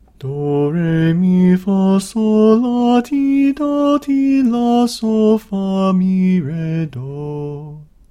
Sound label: Major Scale